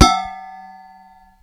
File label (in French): gamelle